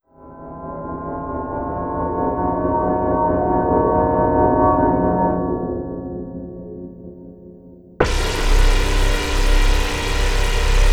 Atmos C

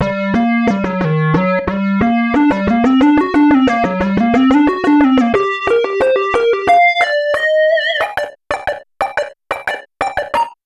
Melodic G 90

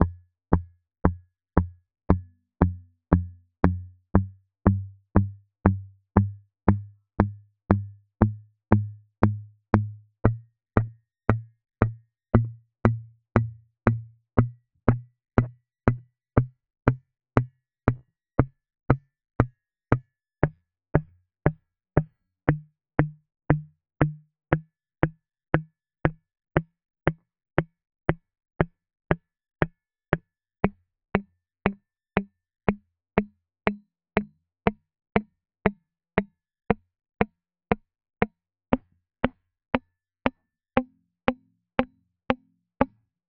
Guitar Dead Note

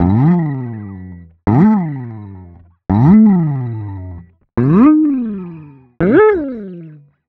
Guitar Slide Effect Up Down

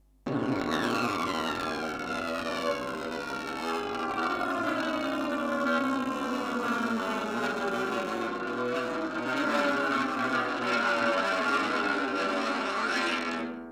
crash, guitar

